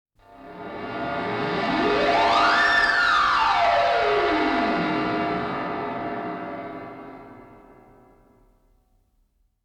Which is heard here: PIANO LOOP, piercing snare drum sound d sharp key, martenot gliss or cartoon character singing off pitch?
martenot gliss